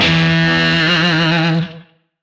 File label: Shawzin E; Warframe; Lizzie Shawzin